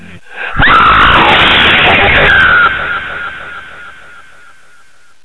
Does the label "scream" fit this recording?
Yes